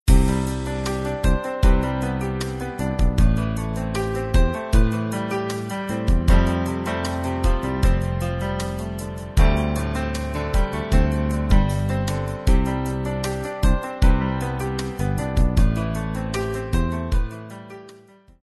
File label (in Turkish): santral fon muzikleri